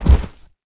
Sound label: bear foot front; npc